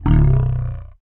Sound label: Growl; Roland BK-5